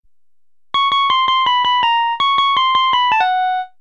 melodiya sms zvuk sms na telefon

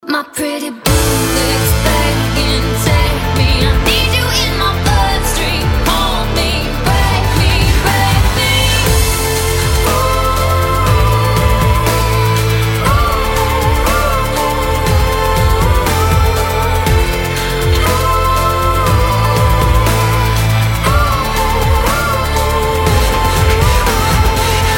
krasivyy vokal i muzyka dabstep

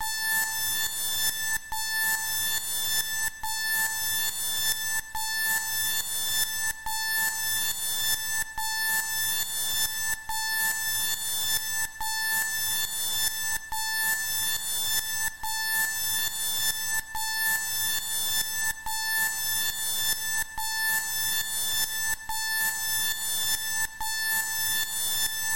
stebuchiy budilnik; ot takogo zvuka nevozmozhno telefon

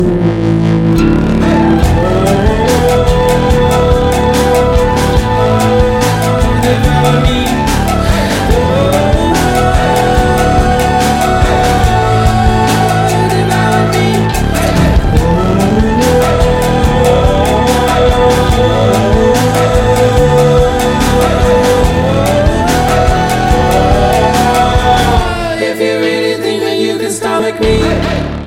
krasivaya i spokoynaya indi rok kompozic

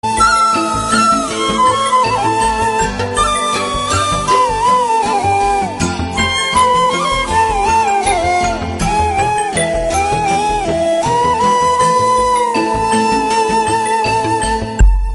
vostochnaya sms krasivaya melodiya na sms

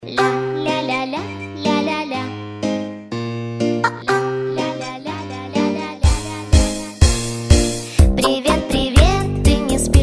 reggi obrabotka

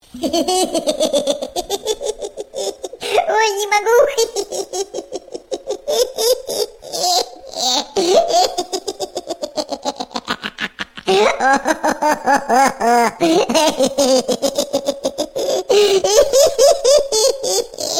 smeh; masyanya-smeh-masyani

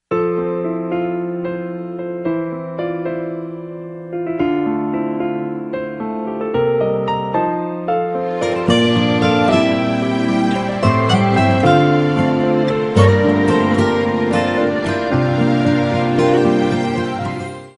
romanticheskaya sms krasivaya melodiya na sms

